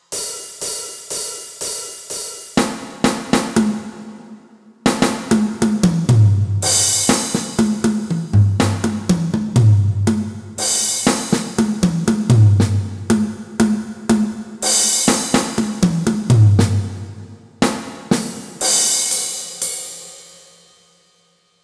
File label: drums hand